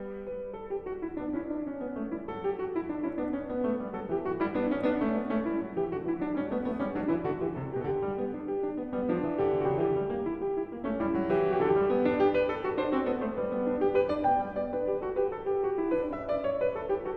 Bach Goldberg Variations BWV988 Variation 11 - Allegro E Leggiero